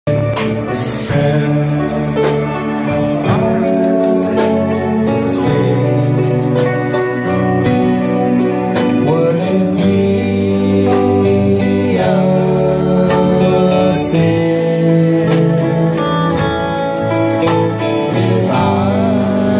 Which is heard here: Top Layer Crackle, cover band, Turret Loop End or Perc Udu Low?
cover band